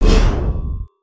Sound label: carrot slash; weapons; aronwk collection; ndaudio